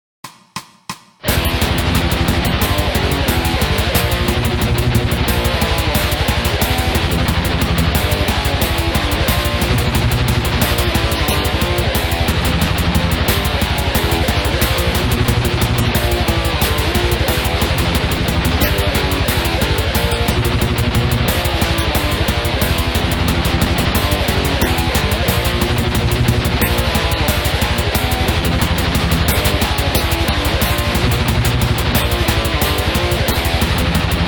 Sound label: backing track